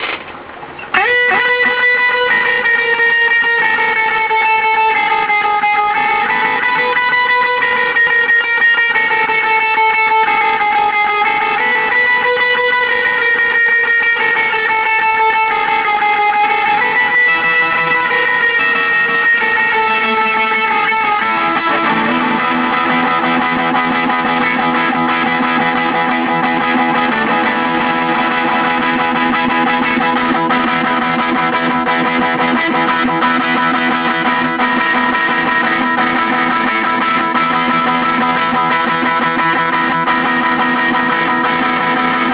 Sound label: delay